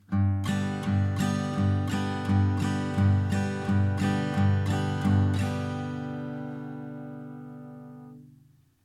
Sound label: country strumming, guitar